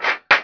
cock; quake

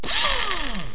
Motor; Busy people of hamsterland

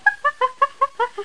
HAPPY; Bible Fun Pack; MAZE

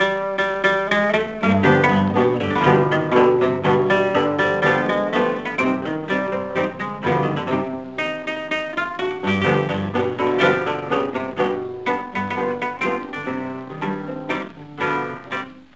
guitars, Tango

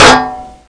hit tank self; Bolo

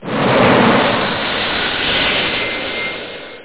Take Off; dithell in space

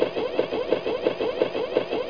legs walk

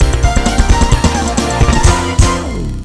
jingle

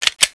pull rlse, guns; KABOOM! - Wild and Wacky Sound Software

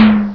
platform disappear; Ferazel's Wand Demo

